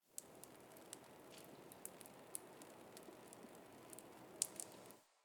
Exterior Water Drip; Warframe; Ambience; Nineteen Ninety Nine; DE

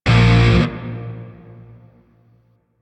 Shawzin Phrygian Chord I; Grineer; DE; Warframe

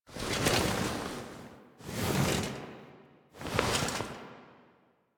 Sits Up Foley; Cinematics; Teshin; Warframe; The New War; Throne Room

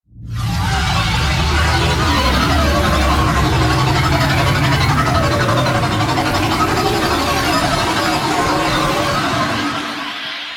Extraction Depart, Vehicles, Flight; WM Liset

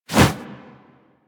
Arm Swing Foley Heavy; Enemies; Purgatory Warrior; Warframe

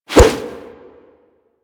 Brawl Whoosh A; Warframe; Brawling

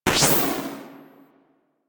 PvP Kill Points; DE; Warframe

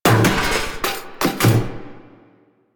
Race Music Ending; Warframe; DE; K Drive Races; Fortuna

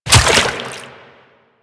Step Water, Footsteps; Warframe; Quests; The New War; Kahl

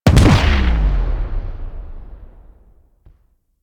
Missile Launcher Explosion; Weapons; Warframe; Railjack

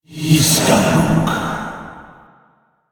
Alt Fire Whispers; Weapons; Warframe; Tenno; Grimoire

